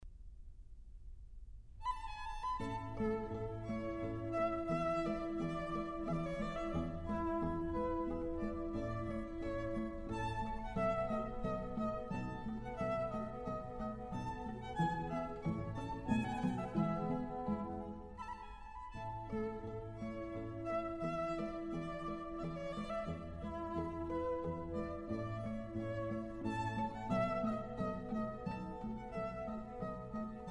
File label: Quintet in E Major, Minuetto